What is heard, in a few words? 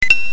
coin